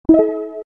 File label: Notify; jellyfin media player